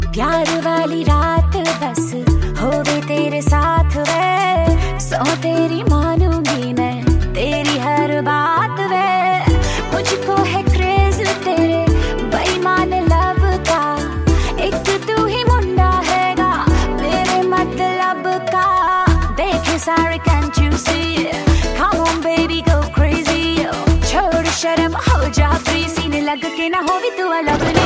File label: Hindi Song Ringtone